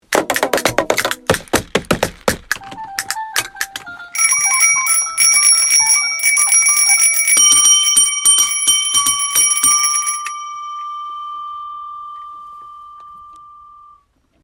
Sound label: alarm sound